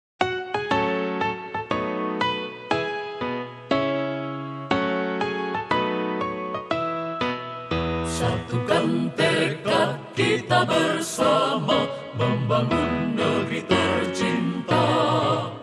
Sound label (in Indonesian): Mars versi piano; MAYOGA